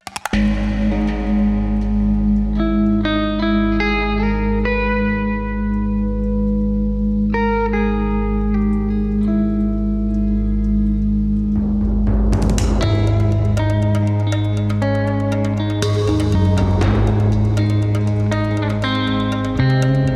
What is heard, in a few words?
Atmospheric Movie